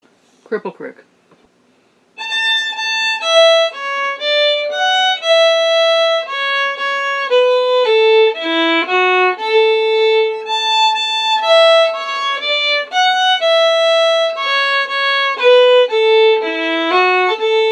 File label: Cripple Creek Key of A